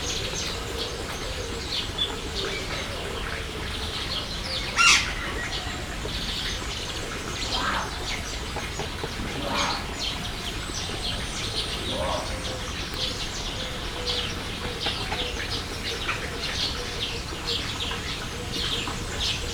távolról, mocsári hiúz; jaszberenyi zoo 2015

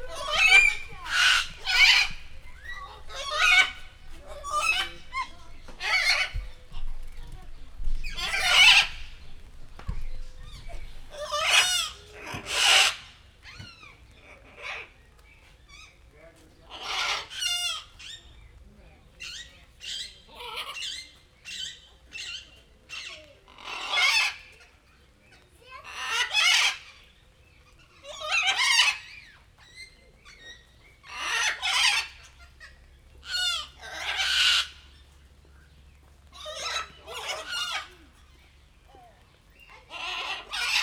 madarak elcsendesednek eső felerősödik, zöldszárnyú arapapagáj; Győri Zoo 2014

